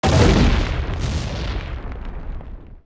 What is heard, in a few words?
Burst; Ganondorf; zelda; OOT